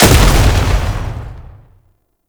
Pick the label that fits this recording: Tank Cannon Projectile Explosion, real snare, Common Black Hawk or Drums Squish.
Tank Cannon Projectile Explosion